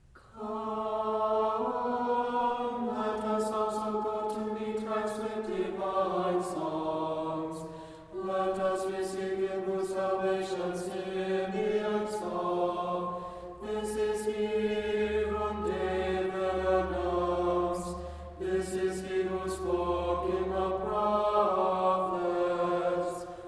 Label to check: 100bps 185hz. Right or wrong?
Wrong